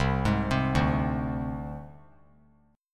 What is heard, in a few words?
C Suspended 2nd Sharp 5th, Csus2#5 chord; arpeggiated; JGuitar